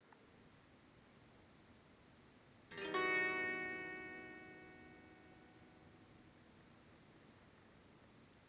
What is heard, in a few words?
chord